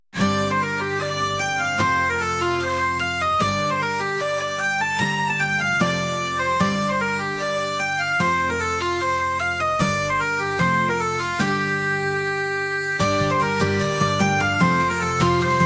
calm

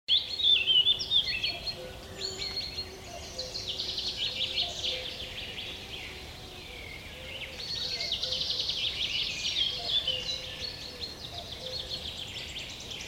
forest, ambiance

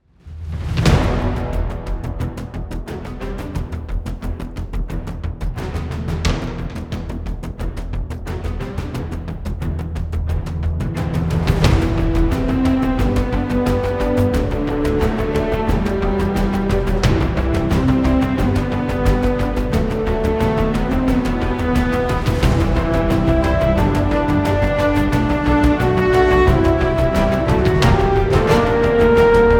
combat

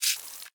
attack, spider

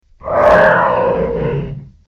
pain, troll

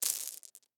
set, figures set on table

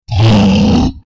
hit, troll

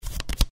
card flip; effects

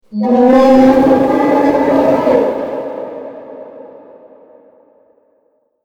pain, dragon white tiny

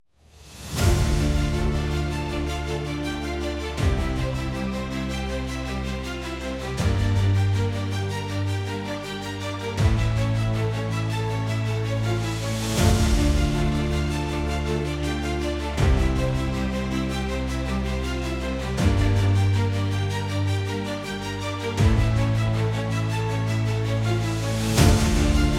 combat

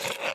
pickup, figures

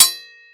sword strike; weapons

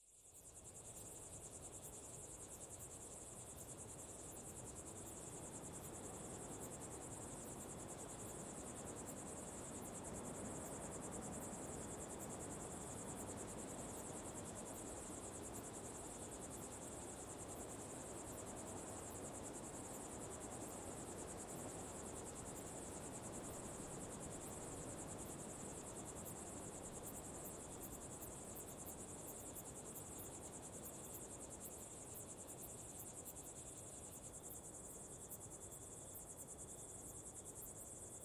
desert; ambience

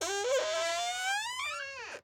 door

